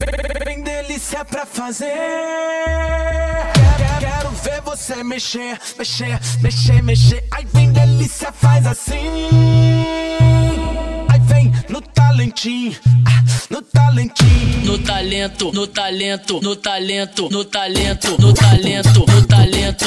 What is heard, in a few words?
funk bass